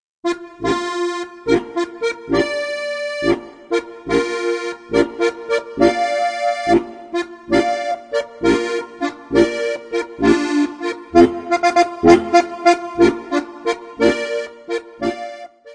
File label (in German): gams tot signal; Halali